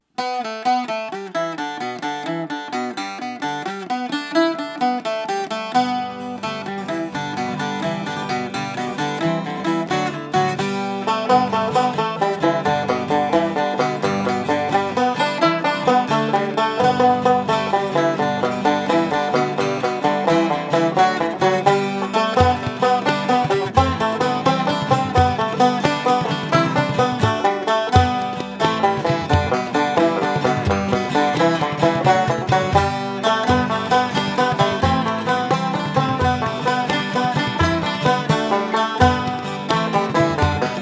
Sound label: jig